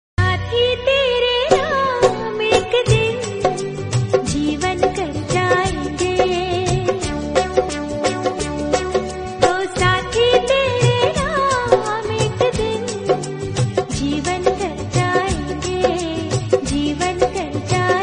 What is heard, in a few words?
hindi movie song